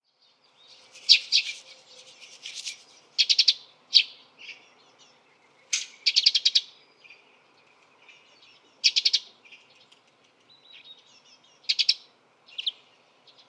pilfink Passer montanus locklate

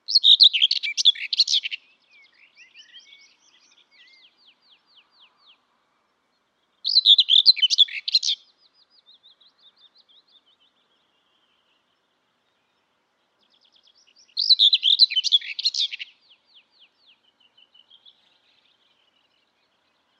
tornsangare Curruca communis

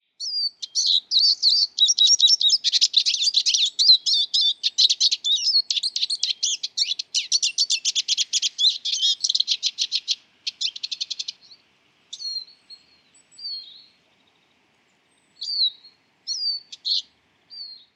gronsiska Spinus spinus

